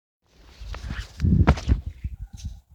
The Sound Of The Jump

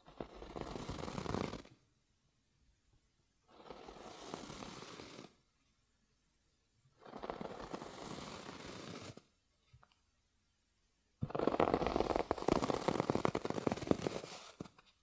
Fabric Being Ripped

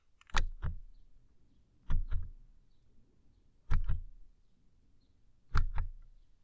Press Radio Knob

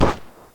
Crossbow Ballesta

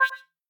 Beep Space Button

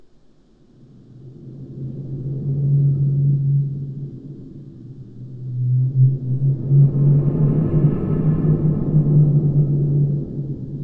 Wind Spooky

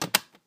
Old Computer Lid Close

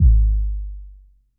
Rusty Box 808 Style Kick

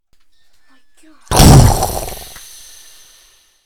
Explode

